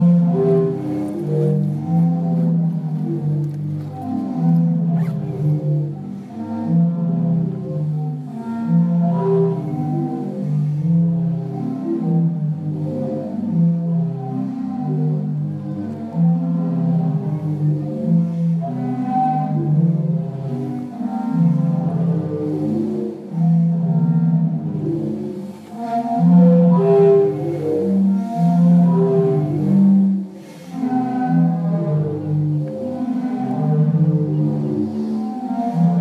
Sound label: Sea Organ Zadar Croatia